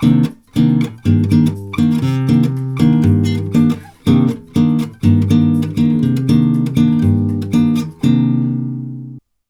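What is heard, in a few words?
120 guitar C minor, Brazil Bossa; USB Soundscan; AKAI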